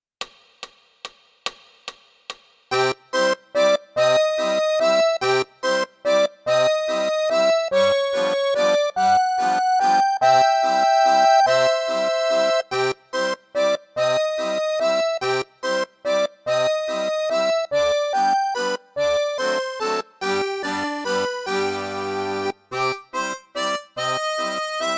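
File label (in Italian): Fisarmonica; Czerny; valzer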